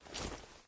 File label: angelic step; Smite; Thanatos